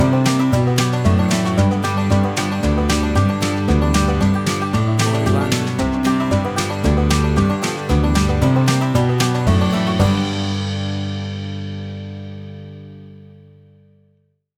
Bouncy Country Fiddle Music

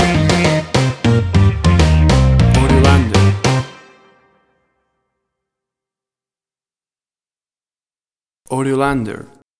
70s Funk Band Music Theme Stinger

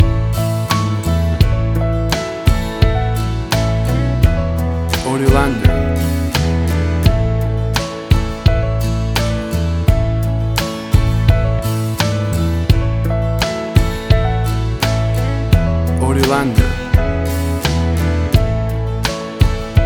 Warm and Soft Country Wedding Music

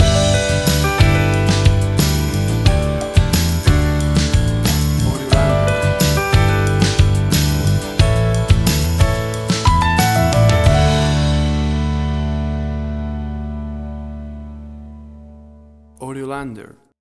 Acoustic Guitar

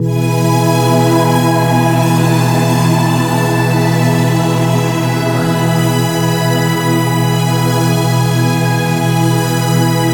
Atmosphere of Dreams

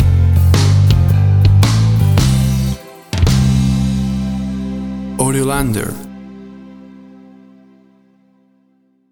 Upbeat Party Piano Soft Rock Stinger

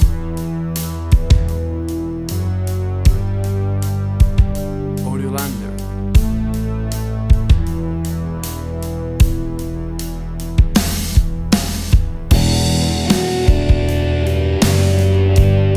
Big Classic Rock Ballad

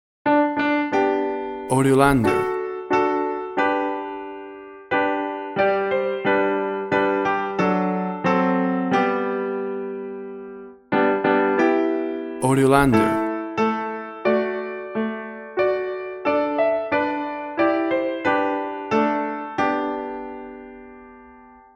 Zimbabwe National Anthem Piano; zimbabwe national anthem piano version

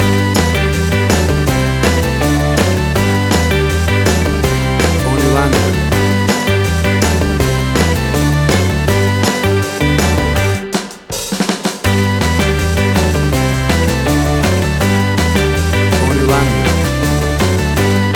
60s Night Spot

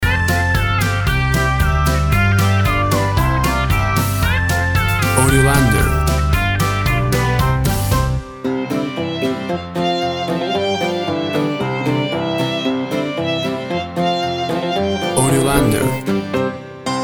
Upbeat Happy Kids Music

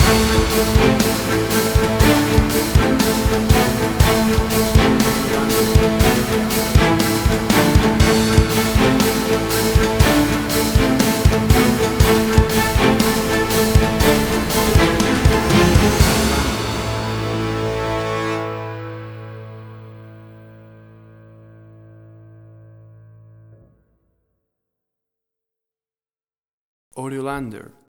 Big Epic Music

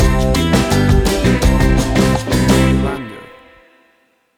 Calypso Summer Holiday Island Music Stinger

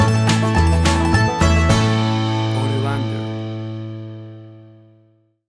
Banjo Versus Fiddle Stinger

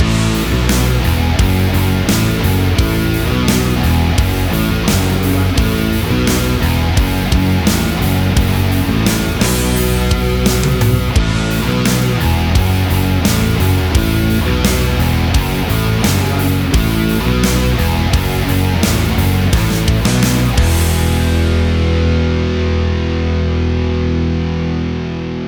Big Heavy Metal Orchestral Fusion

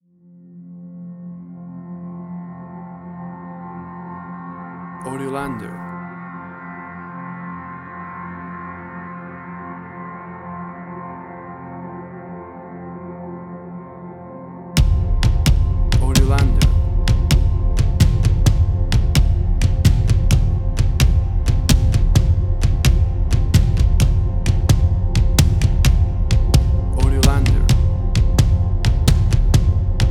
Drumbs of War 130bpm

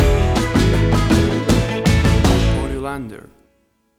Calypso Island Summer Groove Stinger

